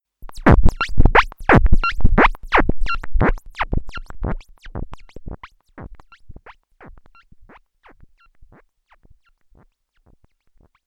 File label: funny fen fire, dark energy